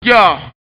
scratch sound

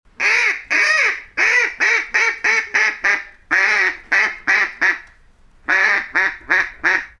duck quack quack

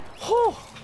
hoohh

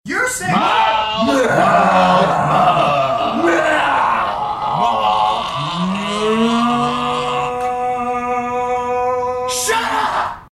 mmmmmmm; whole malk

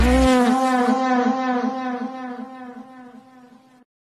power fart

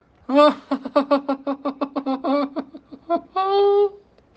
haha

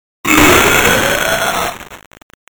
smile vomit bitcrush; item asylum

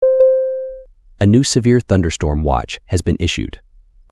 severe thunderstorm watch

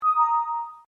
windows 7 notification sound

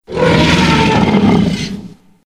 t rex roar

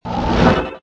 teleport; sorc

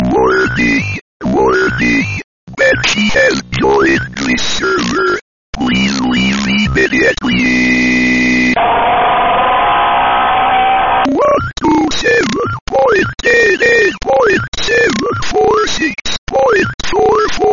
banshee troll

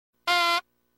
noise; mr saturn; earthbound

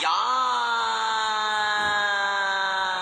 roaring dino

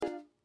banjo

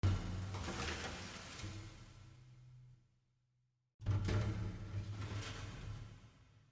vent noise

